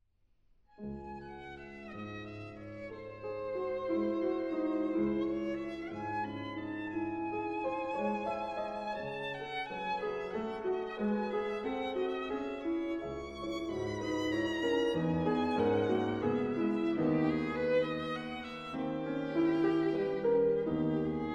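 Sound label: violin sonata; fuchs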